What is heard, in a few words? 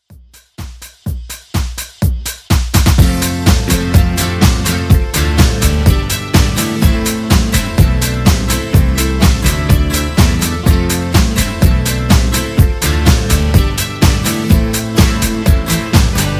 90s Pop Rock Redrum